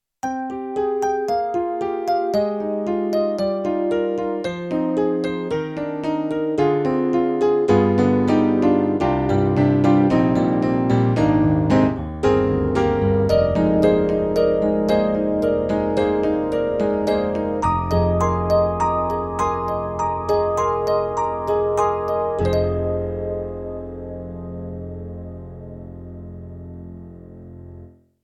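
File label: YAMAHA MOTIF-RACK ES Ballad Stack, piano; Balad Stack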